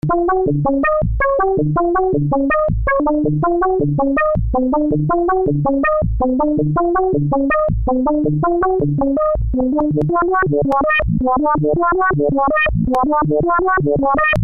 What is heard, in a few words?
waveshape sequence